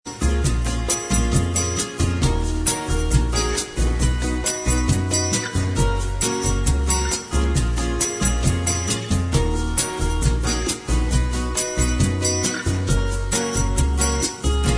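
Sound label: bossa instrumental track, backing tracks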